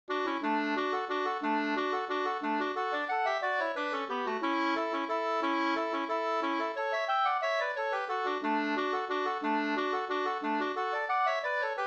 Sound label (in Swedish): vals